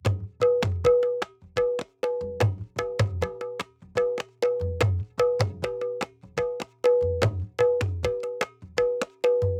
Khool Loop BPM 100, Assamese folk; Gully Loops; waves of bihu

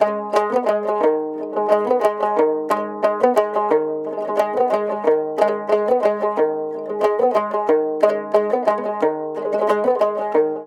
Dotura Loop BPM 90 G Maj, Assamese folk; Gully Loops; waves of bihu

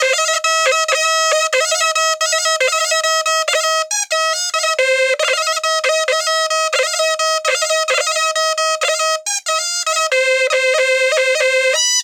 Pepa BPM 90, Assamese folk; Gully Loops; waves of bihu

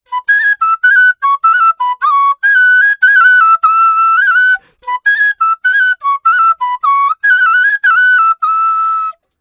Flute Loop E Maj 100 BPM, Assamese folk; Gully Loops; waves of bihu

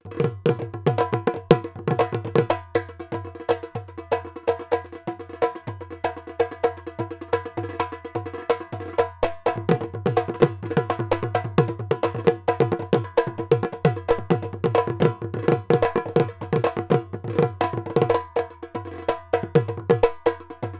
Dhool Loop, Assamese folk; Gully Loops; waves of bihu

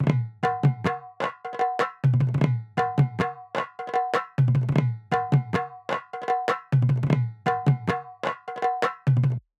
Negera Loop BPM 100, Assamese folk; Gully Loops; waves of bihu

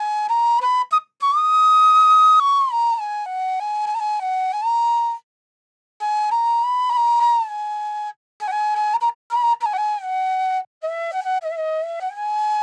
90s Flute Loop 100 BPM B; Gully Loops; flute melody bundle

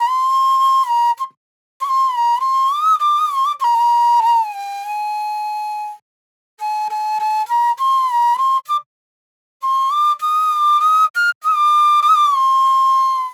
90s Flute Loop 100 BPM C; Gully Loops; flute melody bundle

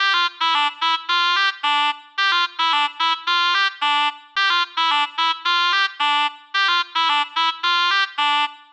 Baraat Shenai Loop BPM 110 C; Gully Loops; baraat shenai essentials

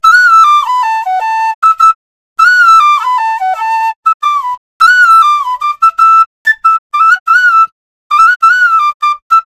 Hip Hop Flute Loop 100 BPM G; Gully Loops; flute melody bundle

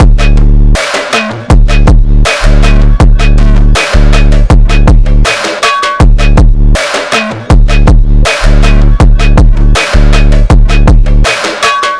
Drum Loop 160 BPM; phonk; Gully Loops; Brahmaostro; Aatma; atma phonk sample pack

